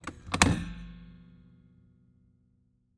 Transportation Seat Full Size Van Seat Front Passenger Seat Adjustments Backwards